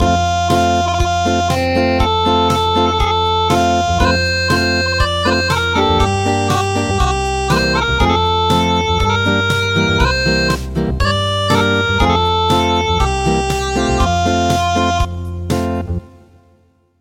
Acoustic

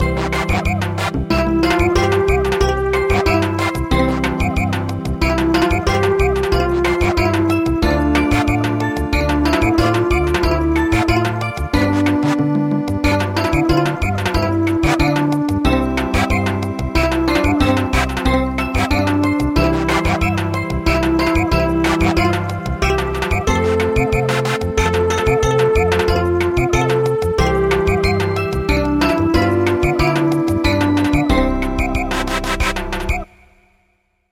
Samba; My Baloon

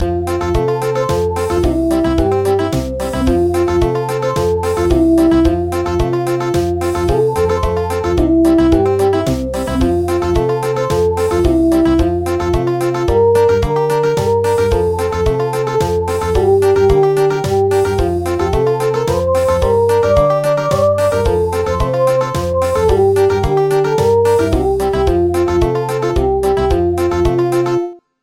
Country; Hooray For You Who Turn Your Year

